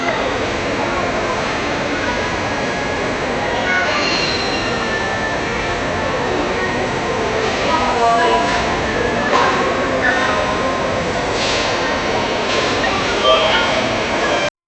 shopping center sounds, background ambiance

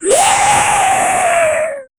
8 bit retro sound effect; pixel art

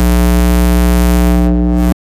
cosmic electromagnetic

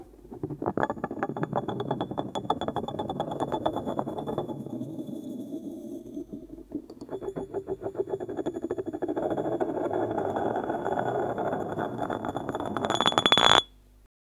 spinning a bottle on a

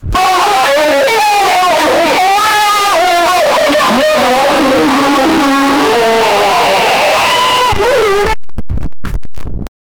combine the sounds of a, bear

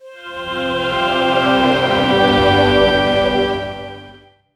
angelic magical orchestra; orchestral

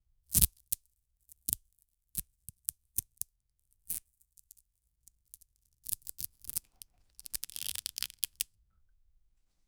thick rope tensioning

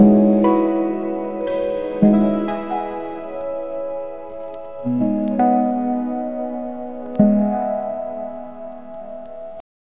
dark melancholic lo fi; dark melancholic lo fi wi; acoustic

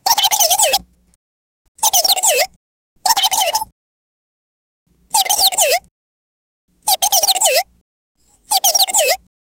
cartoon pencil skrrt skr; pencil scratch

